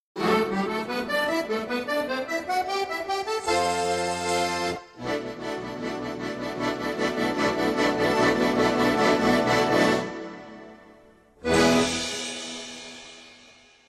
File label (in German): Akkordeon